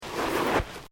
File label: Footsteps Boots Sand Deep Step; Footstep Foley; Human